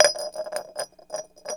JAR SPIN LOO; Rarefaction; Poke in The Ear With A Sharp Stick

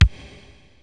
505 Drums, Roland TR-505

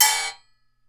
RECO RECO, Brazil Drum Perc; E-MU Producer Series Vol. 7 – Old World Instruments

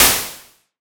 SNR, Yamaha TX7 synth drums

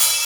OP HAT; East West

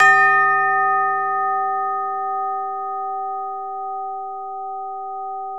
D#3 CHIME, ORCH. CHIMES; Northstar - New Gold VOL-2

